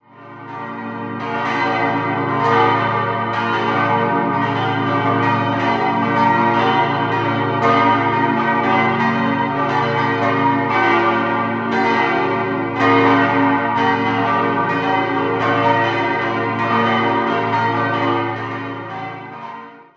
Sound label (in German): Dinhard, Glocken